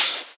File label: Open HH, Cymbals